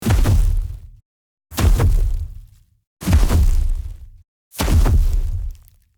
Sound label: Dragon footsteps heavy walking